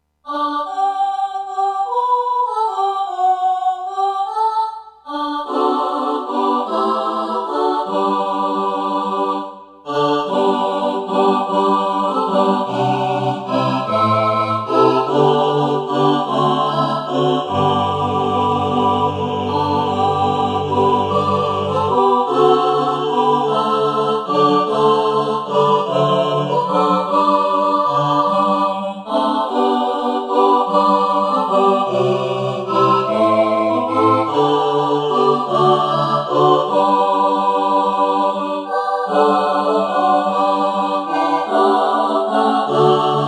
SSAATBB, choir

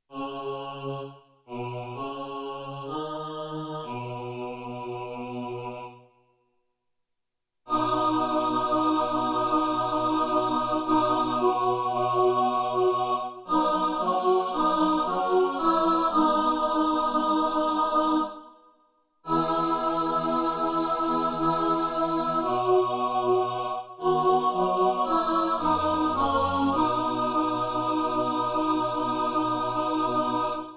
dawn alto, choir

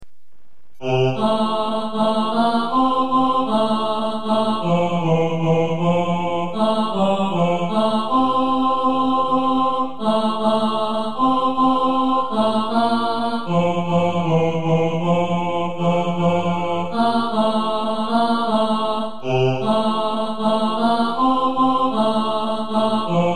tenor, choir

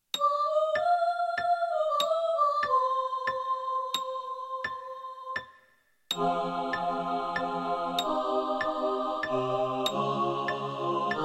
satb, choir

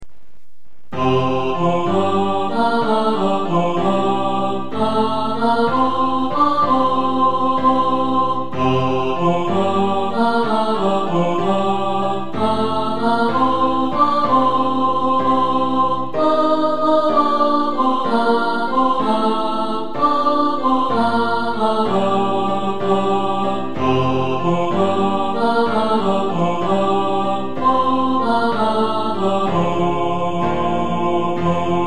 spring all, choir

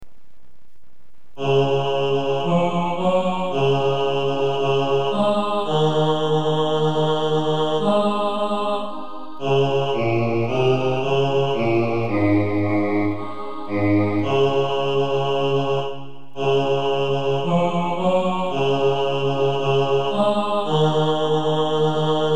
bass, choir

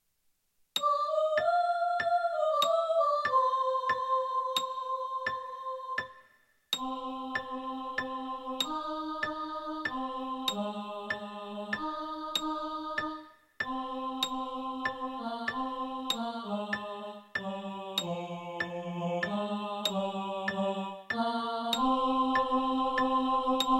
tenor

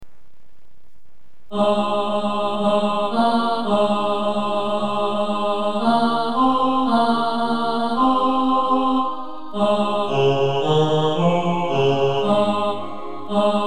tenor, choir

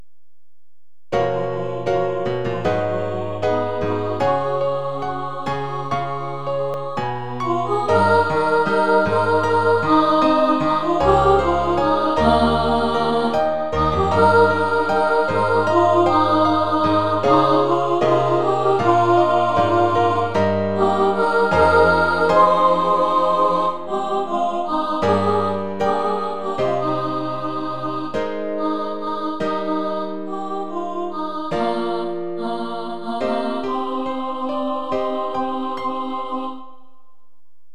solo

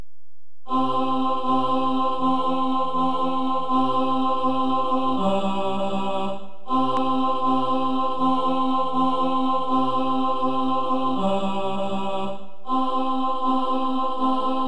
tenor, choir

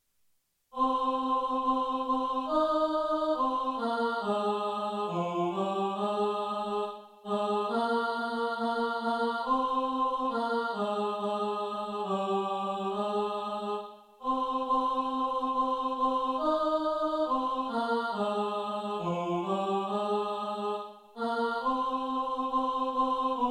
norway satb, choir

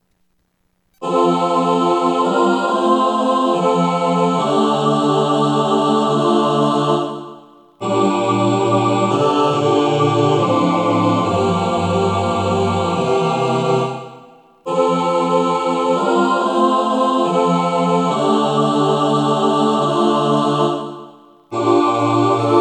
Bleak Midwinter SATB, choir

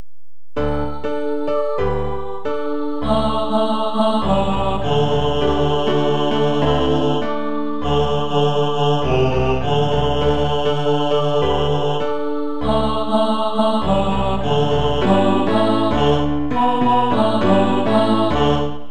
kuna bass, choir

